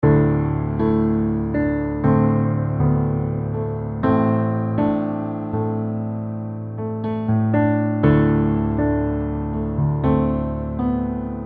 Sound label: EZkeys 2 Eighties Ballads